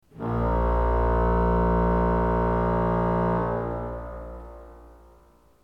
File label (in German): ton, orgel